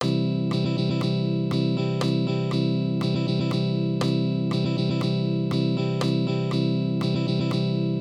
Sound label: Strumming patterns, guitar